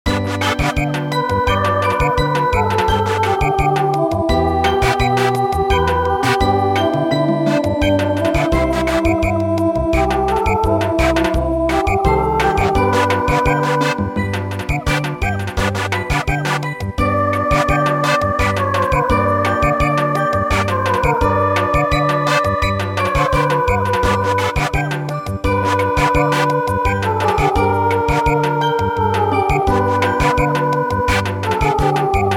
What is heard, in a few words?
Samba, midi